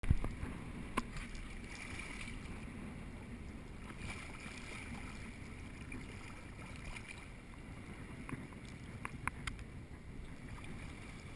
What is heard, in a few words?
kite